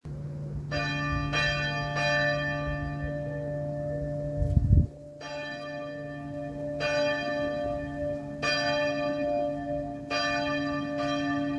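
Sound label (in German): Tonaufnahme d1 f1 a1 c2 d2, mehrstimmige Geläute; 1956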